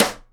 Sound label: SIDE STICK, Live Snares